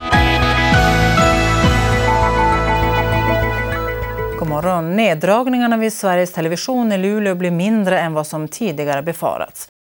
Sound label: Jingle, in stereo